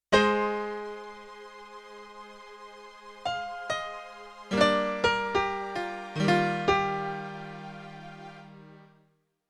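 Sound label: Octave Strings & Piano; Yamaha TX16W; Orchestral Performance 2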